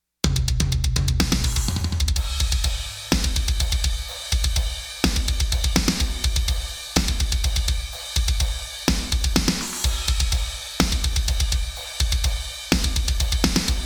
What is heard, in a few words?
drums off